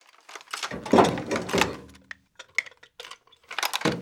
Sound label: satellite idle; cliff; Call of Duty Modern Warfare